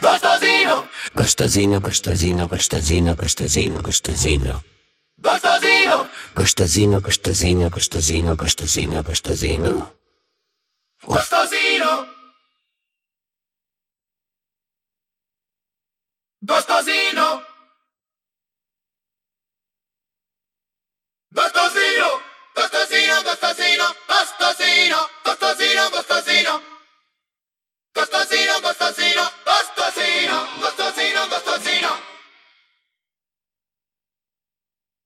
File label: Vocals